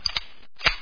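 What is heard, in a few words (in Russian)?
закрывание затвора от ружья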